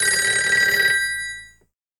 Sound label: ring signal; Gadu-Gadu 8.0